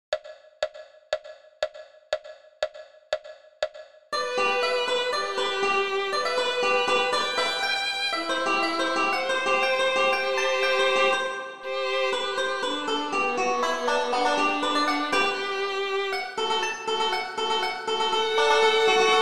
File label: violin banjo